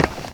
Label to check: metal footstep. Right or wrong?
Right